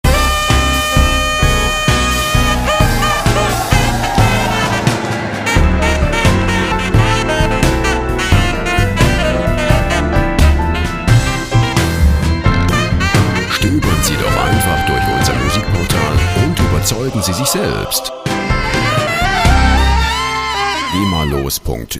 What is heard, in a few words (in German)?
gospel tusch